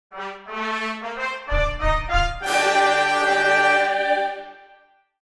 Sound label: Video game success victory win epic